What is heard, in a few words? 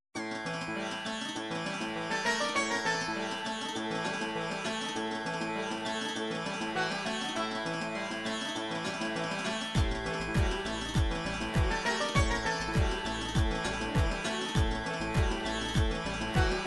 russian bhangra, midi